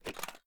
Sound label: reload empty fast mag fly, weapons; gmod; viper